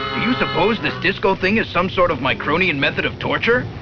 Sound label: disco